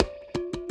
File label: conga; eddyflux; dough-samples